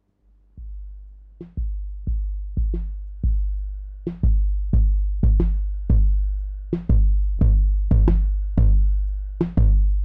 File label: the eternal spring filter 808 Beat DRIVE no CV CUT open; Snazzy FX